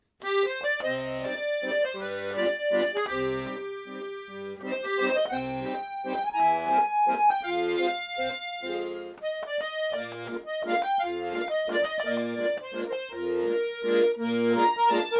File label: WELTMEISTER 28x3 1 valse